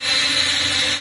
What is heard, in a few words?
swarm; tyrants edge